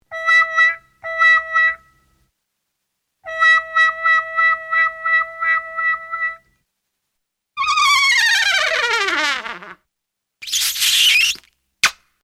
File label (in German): trompete